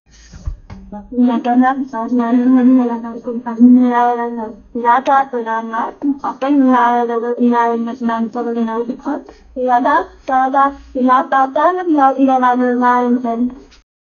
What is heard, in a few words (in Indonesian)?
suasana menyengkamkan, mystical